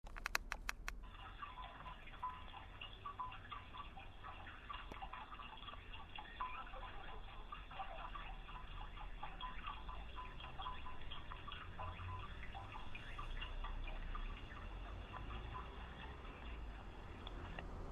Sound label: suikinkutsu